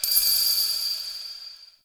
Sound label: interior orbital station ring; Warframe; Tau